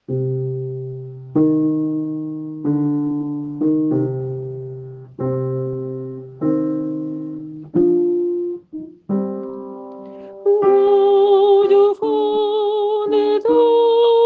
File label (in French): Vent d'Autan debut soprane